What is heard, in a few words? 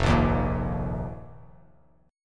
F 7th Suspended 4th F down; strummed; JGuitar